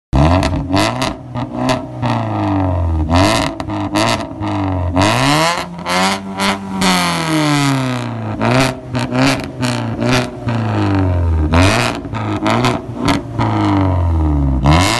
Dena exhaust

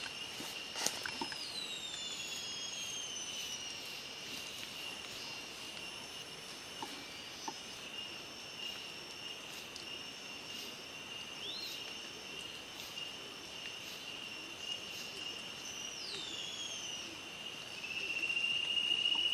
Sharpbill

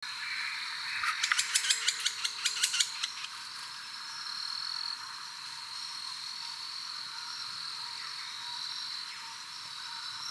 Yellow eared woodpecker

